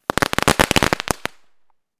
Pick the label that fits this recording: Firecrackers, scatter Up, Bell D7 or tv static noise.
Firecrackers